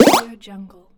8bit hit

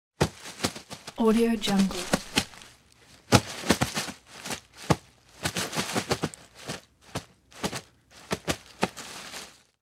crawl through grass

